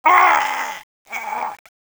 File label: death